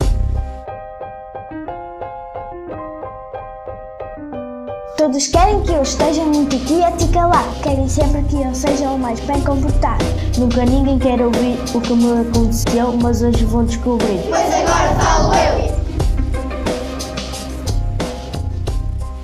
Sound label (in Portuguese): jingle para o podcast